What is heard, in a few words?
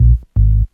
bass, Loops, Phonky